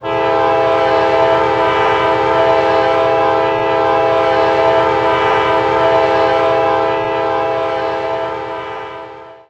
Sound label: k5la, horn; run 8; ns pittsburgh line east horn pack; csx